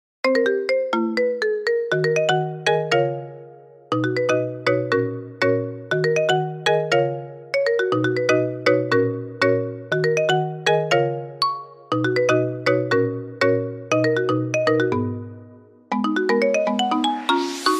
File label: iPhone Marimba Ringtone